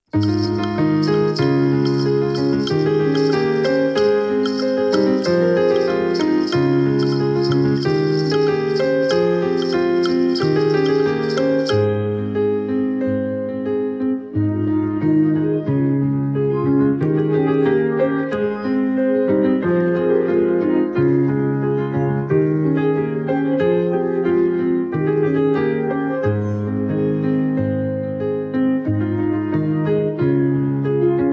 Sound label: instrumental